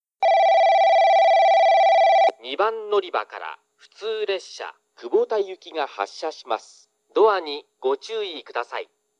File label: balloon